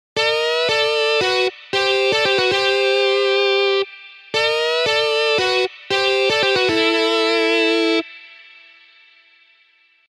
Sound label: Metal Riff